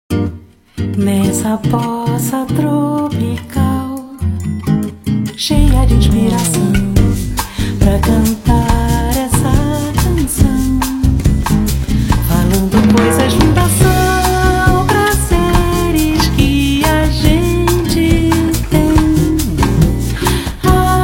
Tropical, Ringtones, Nokia

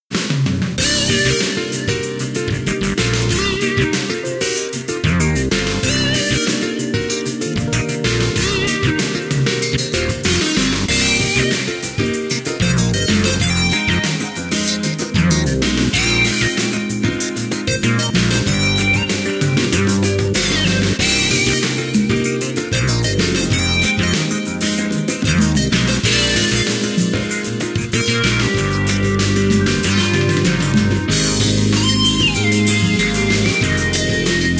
Run Away; Samsung Z2; Ringtones

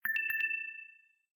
Low Battery in call, phonetones, ui; LG Optimus G F180K

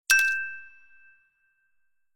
Diamond, Alert tones; Nokia; 515

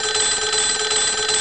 Alarm Clock; Siemens; A31

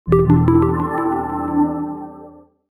STARTUP SOUND; SEMC; Sony Ericsson; K770i

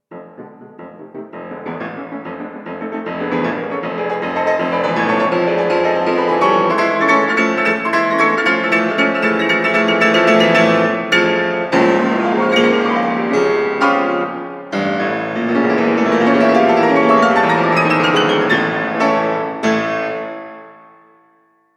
Mephistos Dance, ringtones, Classical Harmonies; Google; Pixel-4

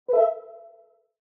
Charging Started; HMD Pulse

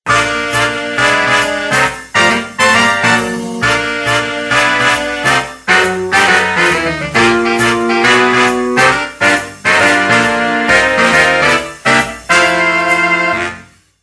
ring jazz, phone; HP iPAQ Glisten

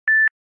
Beep Once; Samsung Z2; Ringtones

